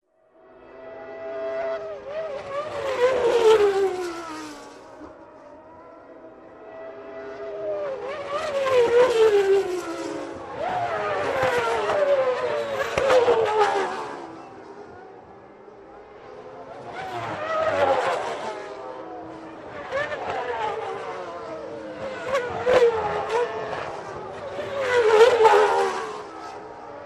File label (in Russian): gonki formuly 1 proezzhajut mimo